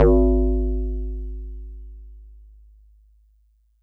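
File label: Metal Tube DX100 G sharp 1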